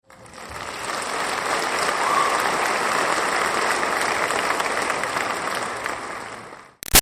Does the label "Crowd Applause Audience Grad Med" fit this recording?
Yes